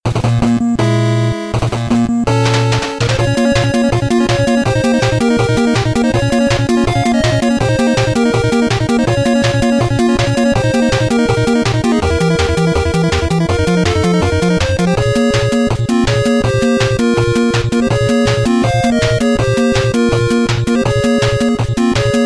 BGM 8bit; Mighty No. 9; 8-bit soundtrack

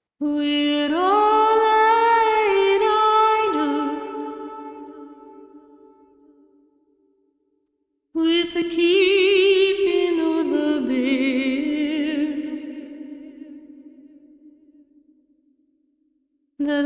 Exploration Vox; Novigrad

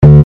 Error; Pipljud Error

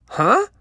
Emote Male Confused; Idle Champions of the Forgotten Realms

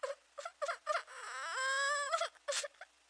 Girl Idle; In the Line of Duty Firefighter

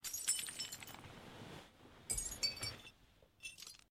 Climb Broken Window; Granny 3; Android

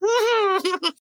inmate pain; devour

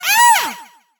Cheerleader pain

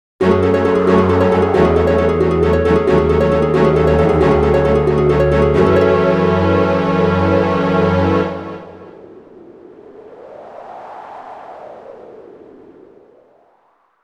Retreat; yggdra union perfect audio collection plus

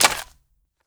archer drop bow

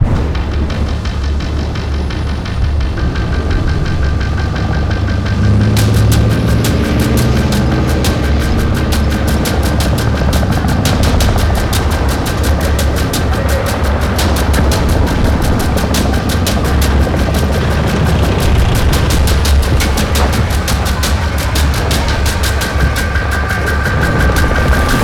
Flooded Music Loading; Battlefield 4

